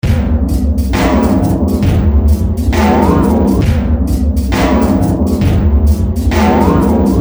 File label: drum